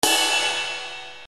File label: RX7 RIDE EDGE, Yamaha RX-7